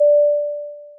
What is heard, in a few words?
cabin chime seatbelt